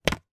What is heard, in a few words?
leisure playing card flick with finger